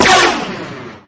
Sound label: droideka fire sound; lego star wars